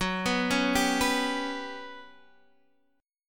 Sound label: G Major 7th Flat 5th Gb